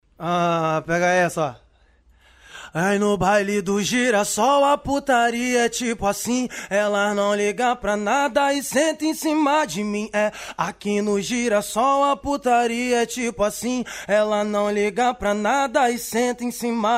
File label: ACAPELLA